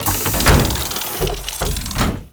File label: Spawn Unravel; Warframe; Corpus; Arachnoid Wraith